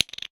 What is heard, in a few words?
household toothpaste tube lid down on surface; sound effects one